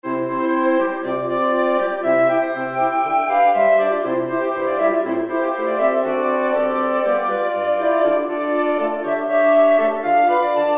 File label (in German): blaeserquintett